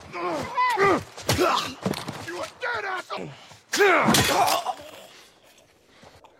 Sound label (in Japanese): 骨折・クラッシュ音; ラスアス